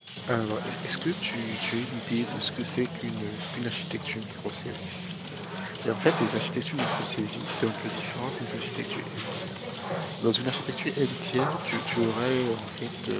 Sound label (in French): extrait avec brouhaha